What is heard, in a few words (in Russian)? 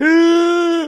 kogda ne hvataet vozduha pri smehe